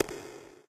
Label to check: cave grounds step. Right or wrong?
Right